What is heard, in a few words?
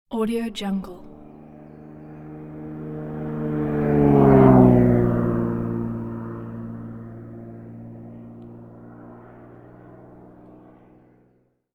quick propeller plane flyover